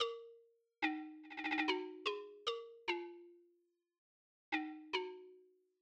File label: 146 BPM D# Min Mallet; Loop Stems; Cymatics; Gems Free Teaser Pack; Cymatics Gems Vol 8; Mirrors